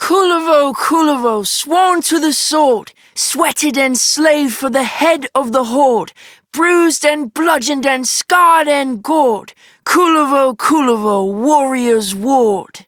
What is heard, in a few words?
Child M, Childrens Rhymes; Warframe; Duviri; Kullervo